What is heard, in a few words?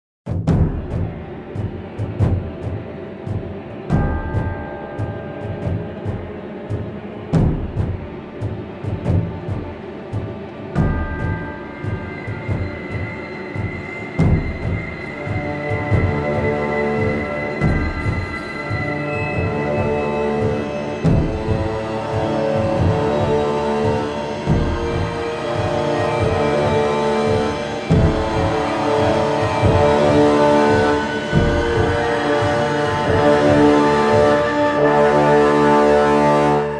opening music theme